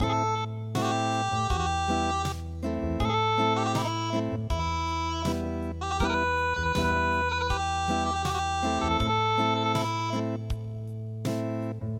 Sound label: Unplugged